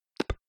Ui Click

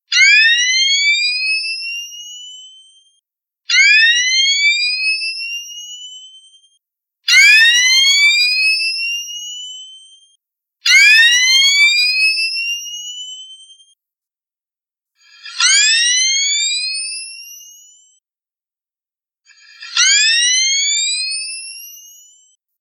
Louder Charging

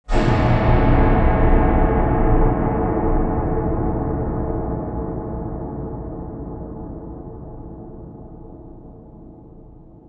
Horror Synth

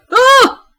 Uagh

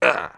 "Agh"

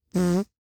Human Short fart

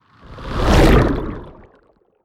Watery Whoosh Fizzy Slow

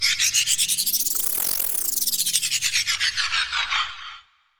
Cosmic Accelerator

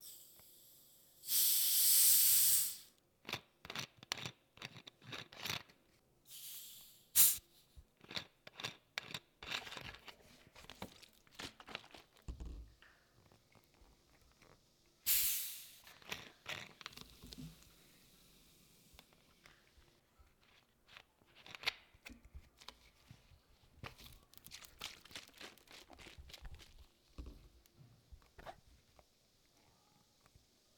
Soda Bottle Opening

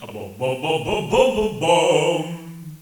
Male Voice Bum Bum Bum

